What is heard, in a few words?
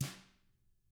TAMA BR P, Drums; ILIO; Double Platinum Drums 1